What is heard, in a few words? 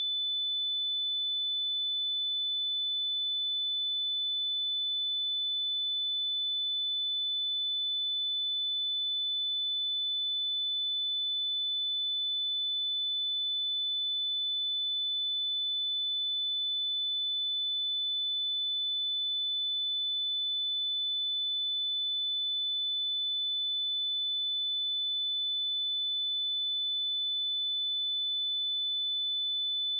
ring; ChaosCraft's Apocalyptic Arsenal; lrtactical; player